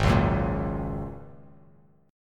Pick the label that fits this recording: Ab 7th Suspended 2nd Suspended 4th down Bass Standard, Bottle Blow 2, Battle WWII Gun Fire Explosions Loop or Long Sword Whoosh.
Ab 7th Suspended 2nd Suspended 4th down Bass Standard